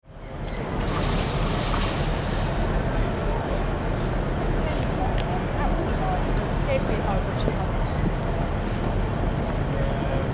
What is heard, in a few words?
Train Mainline Central Station Atmosphere; crowd background - tannoy announcements